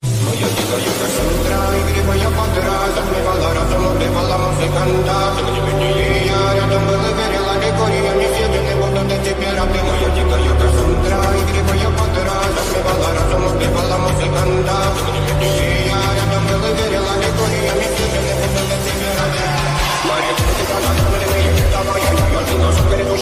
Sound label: BGM Ringtone